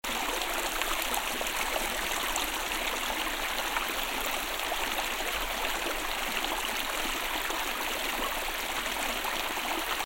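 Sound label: river mouth water flows in river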